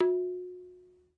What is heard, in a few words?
Na, Tabla; ETHNIC and WORLD PERCUSSION; Tabla Kit Large